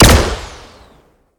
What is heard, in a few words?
Shot Medium Gun Mech Rifle